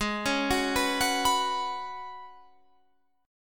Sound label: F sharp Suspended 4th G sharp; arpeggiated